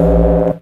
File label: Synth Sound; VEE Synth Shots